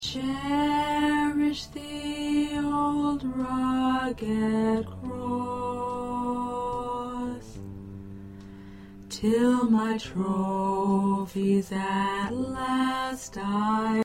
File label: Chorus Alto